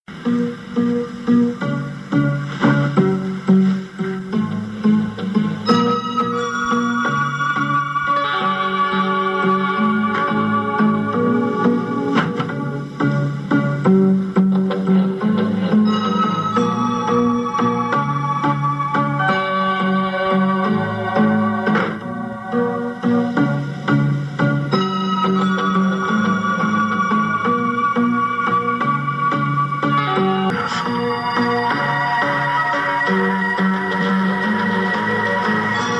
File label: Asian theme